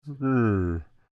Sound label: Creature Speak Groan Short, Human; Groaning; Voice; Big Room Sound